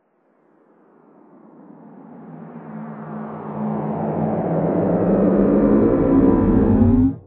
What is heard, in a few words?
ufo; WMBonusXP